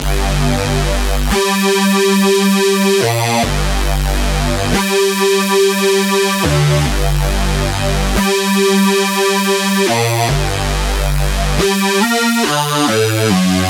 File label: Riddim Synth Loop G Riddim Reese 140; Ghosthack; Riddim Essentials 2020